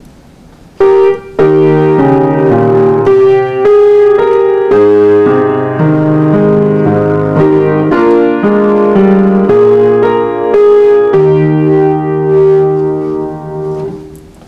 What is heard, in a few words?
music